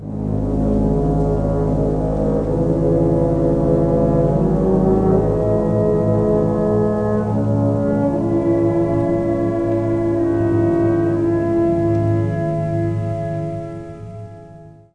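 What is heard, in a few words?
ladder up; Into That Dark Night; YadVashem; shockMusic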